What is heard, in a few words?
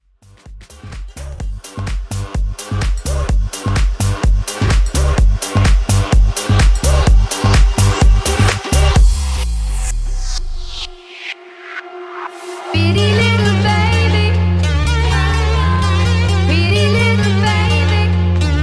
Mashup 127Bpm